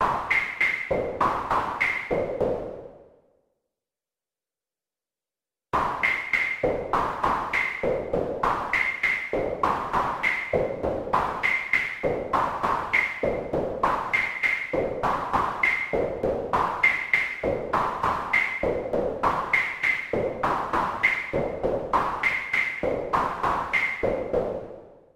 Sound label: Rhythm Canon